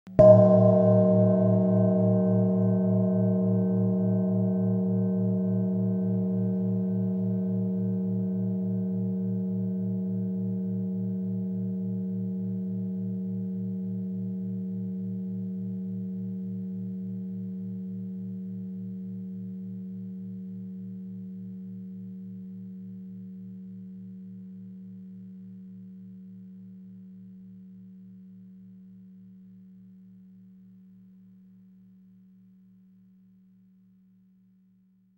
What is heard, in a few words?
gongo forte